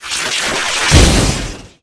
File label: spell magician holy cross